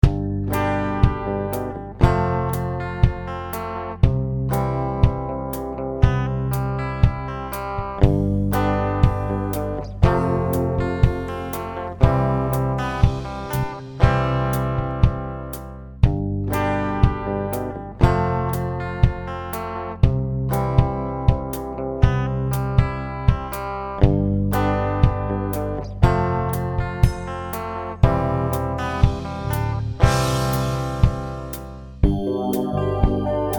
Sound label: Village; Sexxxnator adult sandbox rpg